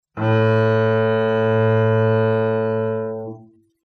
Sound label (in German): leeres a gestrichen